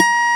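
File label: Spanish A#4, guitar; AKAI S-Series CD-ROM Sound Library VOL-8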